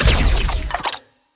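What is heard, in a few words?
Short Laser gun Electron Impeller Fire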